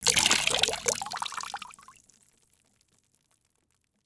Liquid Water Pouring Into Water In Plastic Bucket Little Short Fast